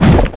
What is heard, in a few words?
Lava Block Step; Stepping on a floating lava block in the Cave of Flames